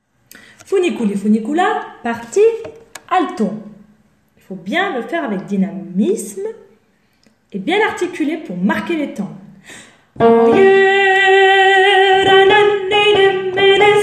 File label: alto; Funiculi